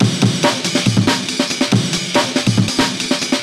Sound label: LoFi Beats, 140 BPM; Vengeance - Essential Clubsounds Vol.4